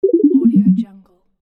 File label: fall down